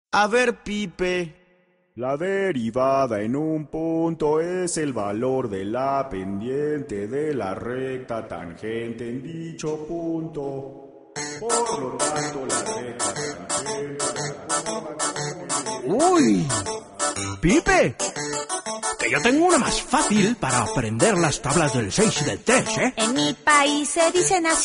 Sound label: pipe